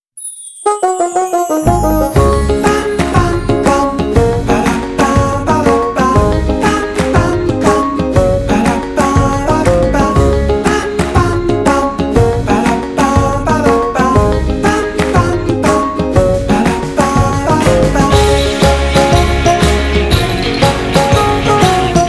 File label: happy children